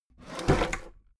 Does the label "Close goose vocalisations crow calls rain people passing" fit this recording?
No